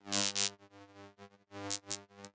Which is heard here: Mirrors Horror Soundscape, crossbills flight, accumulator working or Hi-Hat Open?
accumulator working